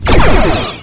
Photon Torp

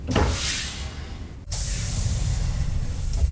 door; ebridge

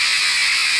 Cardassian

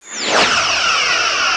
phaser; Weapons

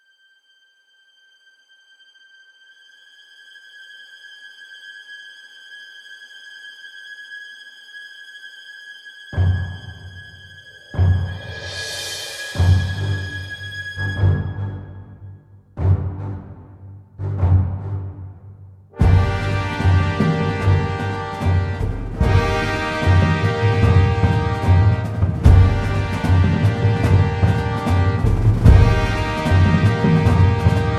Combat Music; DS9FX

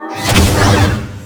Plasma Pulse, Weapons

